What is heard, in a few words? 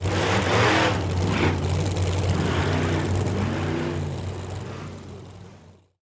sfx monster truck; mels monster truck